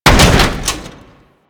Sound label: Moa Body Fall; Warframe; Body Falls